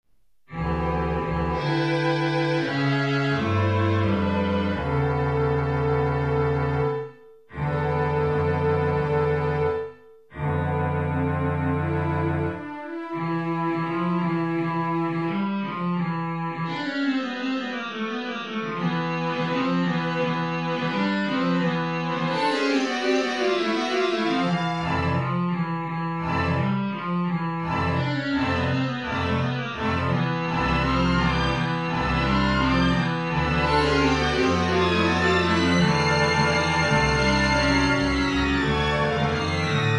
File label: String Quartet